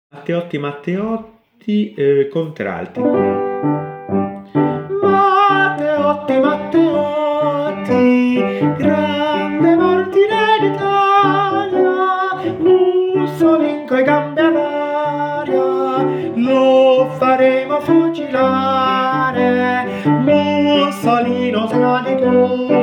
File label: matteotti contralti; Strofette antifasciste